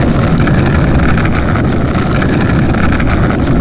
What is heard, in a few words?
roll gravel, terrains; Tony Hawk's Project 8; XBOX